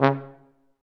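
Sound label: brass F tuba, staccato; Solo Brass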